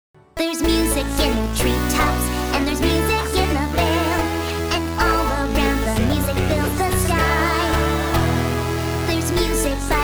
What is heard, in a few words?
Chiptune Cover